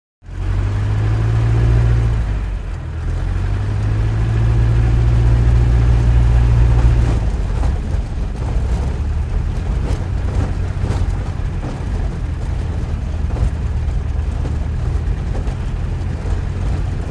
Rattling Metal In Cockpit, Wheels Bouncing On Dirt. Liftoff, Smooth Engine Drone, Some Quiet Rattling In Cockpit. Close Perspective. Prop Plane.; Taylorcraft